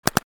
Paintball gun double shot automatic burst; sport

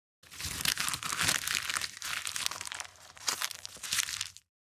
Paper rustling

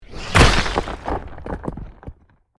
Earth magic spell small impact

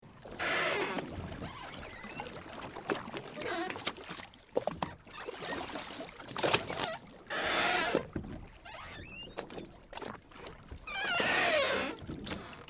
Old crusty canoe rowing creaking and squeaking; boat

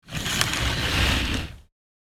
Heavy fabric curtain slide rail rattle; household

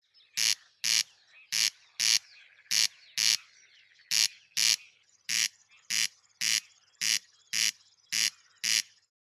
Corn crake crex crex singing

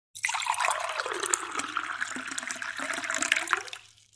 Pouring water

